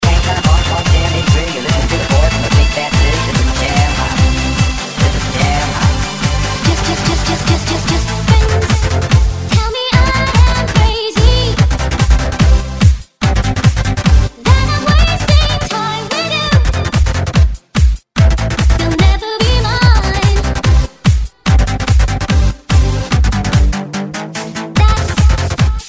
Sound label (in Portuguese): electro funk e electro dance remix